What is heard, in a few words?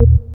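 organ, Stabs; E Lab; XTc Files of House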